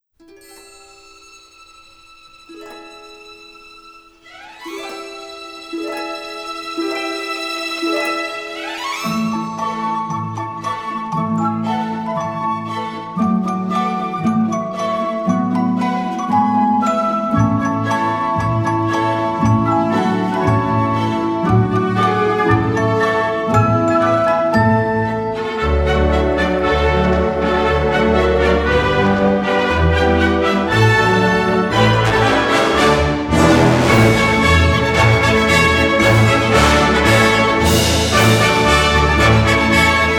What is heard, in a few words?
Jingle Bells; The Irony of Fate 2